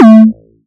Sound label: tom